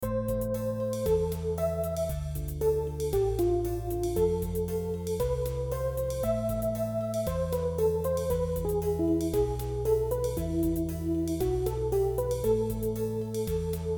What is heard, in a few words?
soft wurly